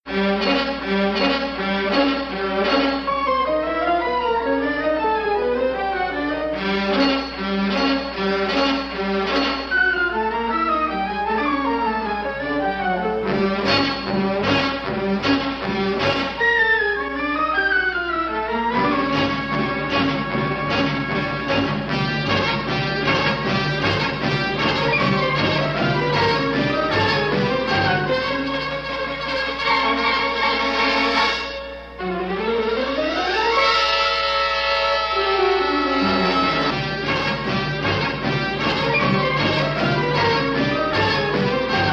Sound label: opening titles; KRAFT SUSPENSE THEATRE